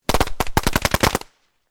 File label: FIREWORKS CRACKER SMALL CLUSTER; Variety of fireworks being detonated